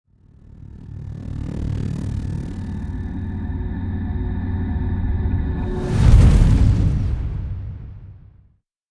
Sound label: Riser Hit